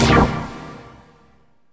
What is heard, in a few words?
Stab C2, Club Techno; Zero-G; Stabs